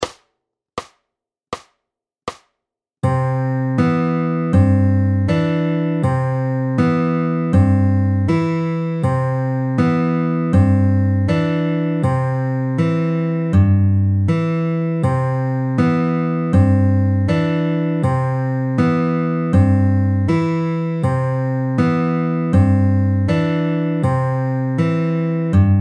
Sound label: Slow, fingerpicking